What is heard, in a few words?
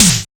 snaredrums, techno; Techno ID